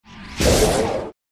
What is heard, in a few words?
Dark Spirit